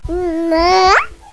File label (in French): rire bebe